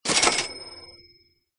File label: register